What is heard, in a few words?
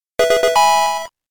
Fanfare! Level Up; Pokemon OST Collection; Pokemon Gold Silver Crystal OST